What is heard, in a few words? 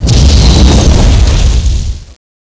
attack meteor; cstrike; Teddy CSO